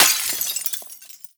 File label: break glass phys small